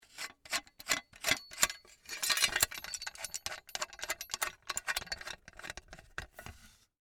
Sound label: Folding Shovel, Sports, Folding, Shovel, Open, Army, Camping; Miscellaneous Sport; Blast Wave